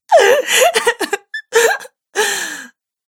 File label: Woman Crying Sobbing; Crying Sound Effects